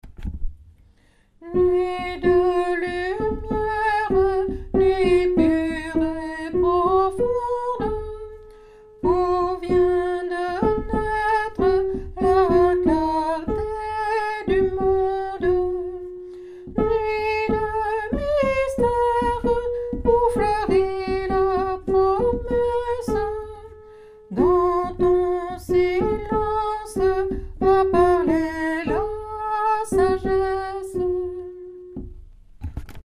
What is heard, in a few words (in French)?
nuit de lumiere soprano; nouveaux noels; chants noel